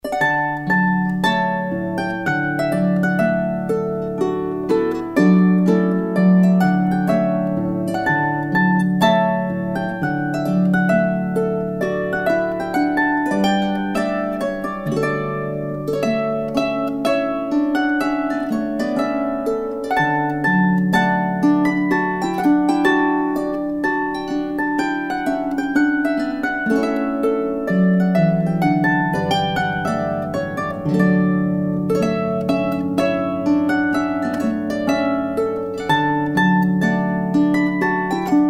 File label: Trumpet Tune